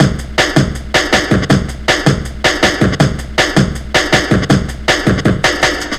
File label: 160, Drum Loops, Drum & Bass; Total Drum&Bass